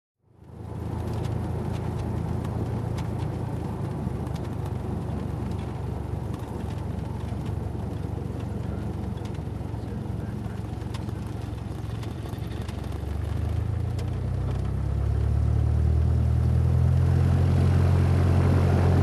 Light Aircraft on Hangars being checked

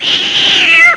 Cat distress meow

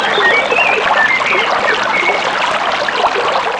Birds in a river

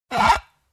Moving dish on table

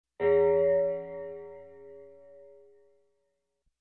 Low Dark Bell Sound

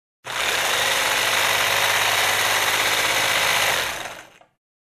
Construction

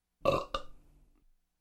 Short Burp

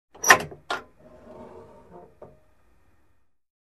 Opening secured drawer

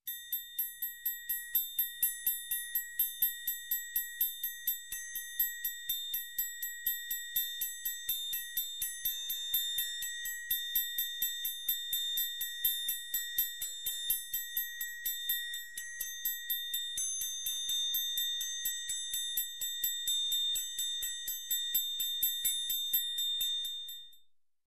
Rhythmic Bell Ring

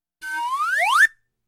Funny cartoon whistle flipping up sound effect

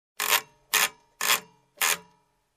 Steel Machine Screwing

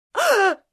Adult Woman Surprise Reaction

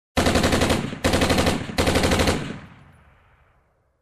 Machine gun combat

